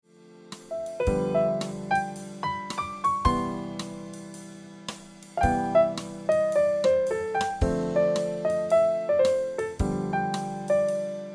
Piano Music

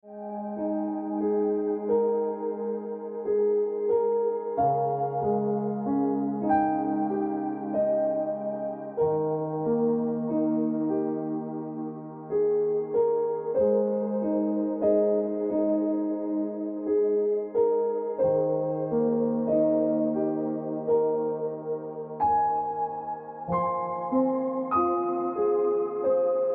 Keep It Colorful Ringtone Piano Music

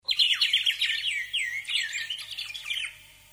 Birds Ringtone

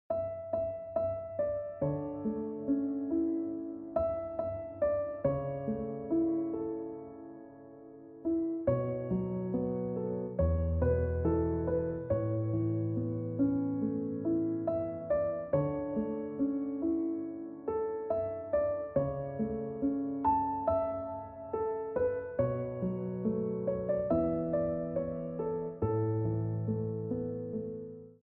Ringtone

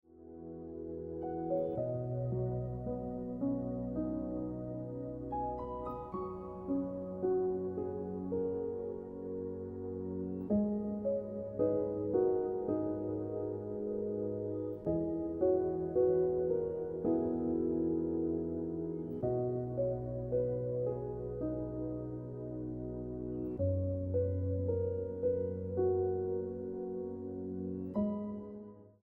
Piano Music

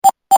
Bbpro Confirm Ringtone

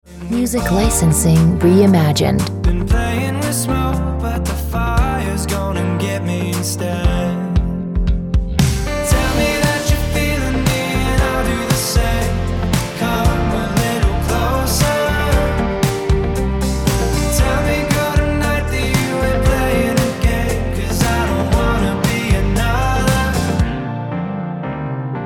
Ringtone

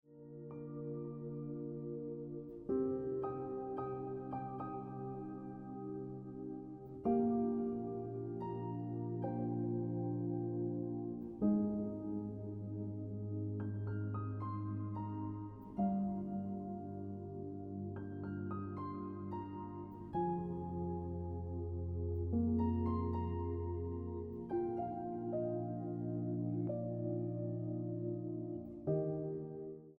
Piano Music

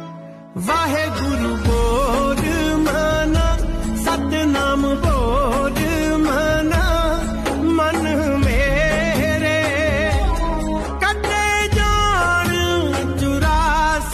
Waheguru Bol Mana Ringtone

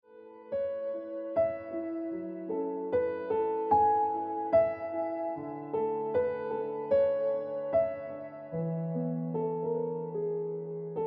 Happiness Of Waiting Ringtone Piano Music

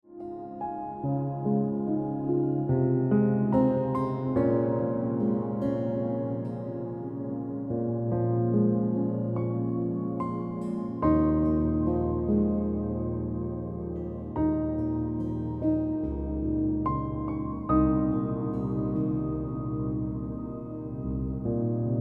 Piano Music

